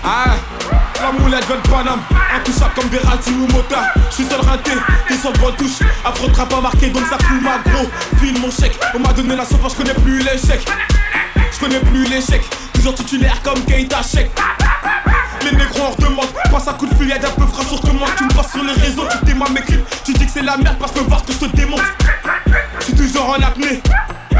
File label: Afro Trap